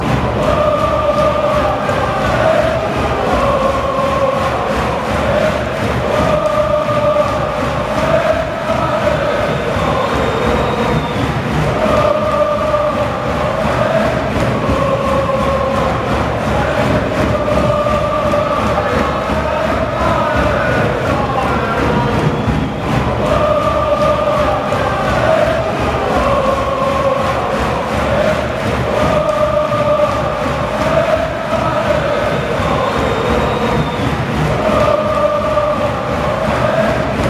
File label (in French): ohoh allez lens allez, fanchants